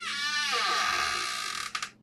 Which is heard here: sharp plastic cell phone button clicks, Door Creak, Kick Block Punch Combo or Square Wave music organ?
Door Creak